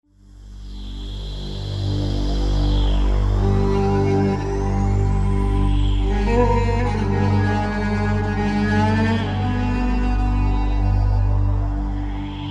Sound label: TAMBUR SONG